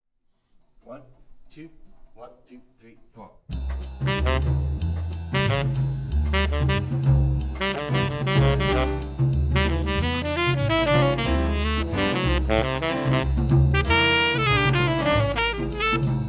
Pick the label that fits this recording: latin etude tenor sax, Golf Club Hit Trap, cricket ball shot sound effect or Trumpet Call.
latin etude tenor sax